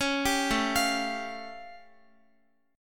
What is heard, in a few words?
A Augmented 7th A Guitar; A+7 arpeggiated; x,x,11,12,9,12